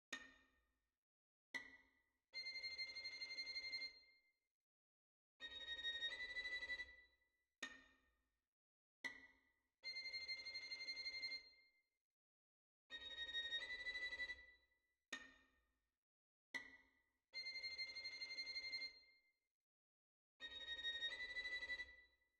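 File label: pattern, violin II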